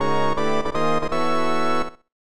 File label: Special Shot Jingle; Special Tee Shot; SNES